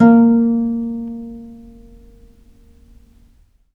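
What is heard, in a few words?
VIOLE PIZZICATO A#2, Historical Instruments; USB Soundscan vol.23; AKAI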